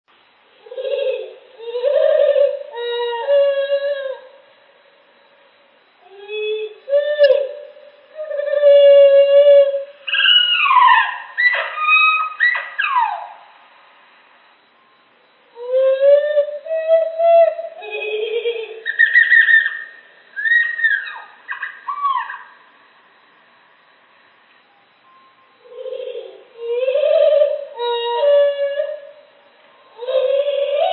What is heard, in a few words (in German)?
amsel; nistkasten